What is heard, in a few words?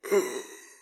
Hero Death